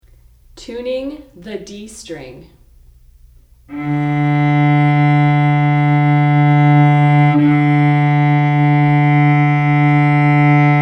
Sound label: Tuning D String, cello; Tuning the D String